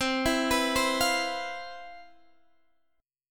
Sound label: C Major 11th C, Guitar Standard; CM11 arpeggiated; JGuitar